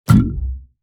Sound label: Puzzle strategy game menu ui tab switch